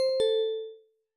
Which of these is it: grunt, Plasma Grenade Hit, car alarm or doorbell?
doorbell